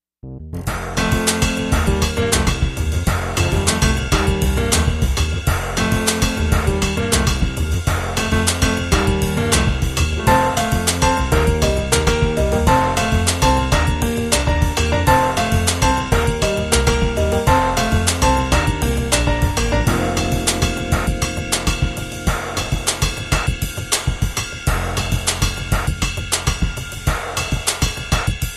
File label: latin promo